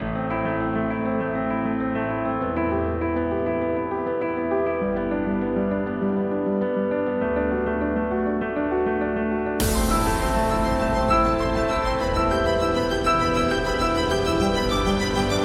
Epic Promo

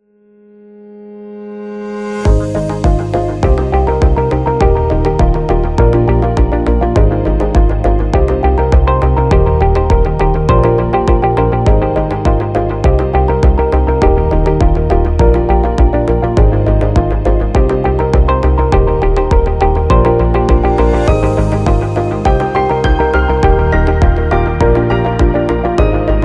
Inspiring Corporativa

